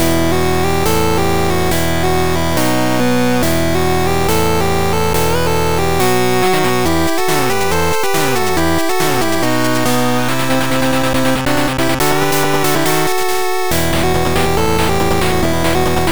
8bit

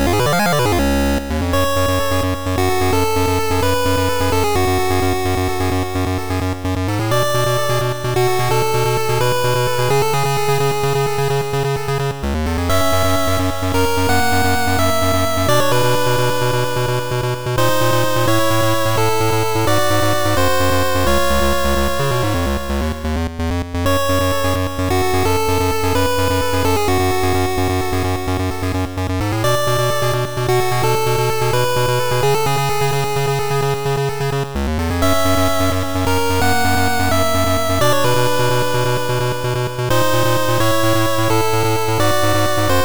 8bit Boss Battle Theme

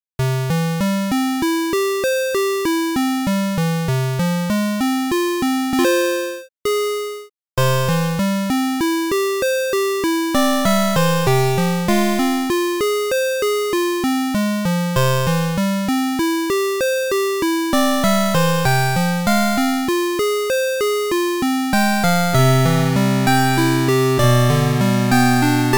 8bit

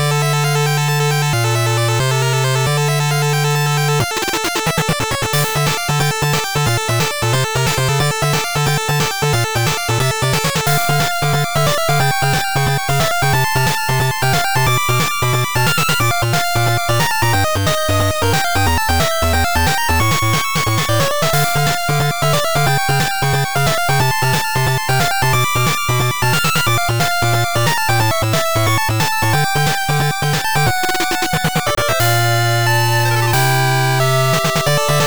8bit The Final Battle

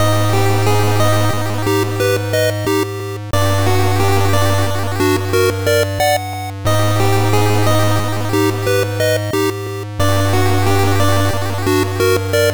8bit

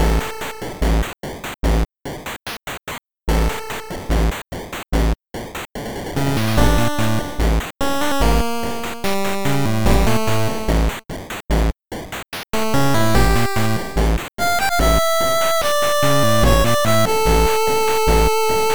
8bit Volcano Dungeon

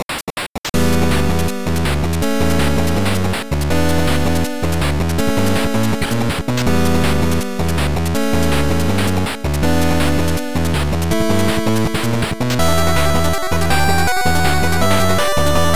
8bit Galactic Battle

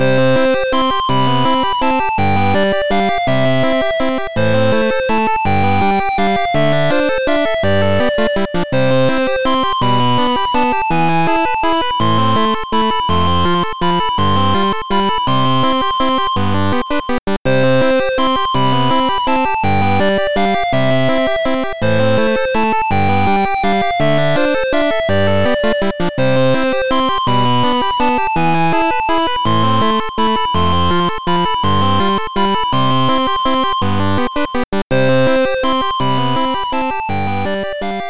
8bit

